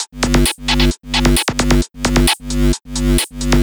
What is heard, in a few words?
Beat 132BPM (No Kick), techno; techno drum fx